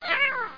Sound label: bath; THE REALM